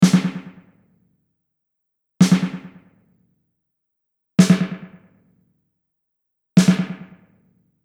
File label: Snare Tape Delay